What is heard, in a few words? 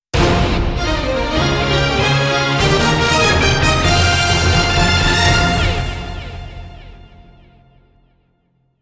ジングル ONLINE Mode completed; EDF4